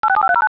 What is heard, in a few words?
Interconnect Alert, scanner